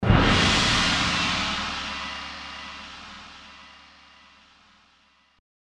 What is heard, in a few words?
gong